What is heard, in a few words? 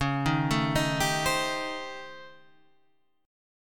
A 7th Sharp 9th Flat 5th; 9,6,7,8,8,8